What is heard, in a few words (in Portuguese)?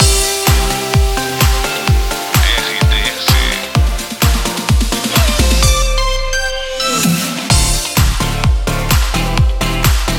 TRILHA PARA LOCUCAO FM; 107 FM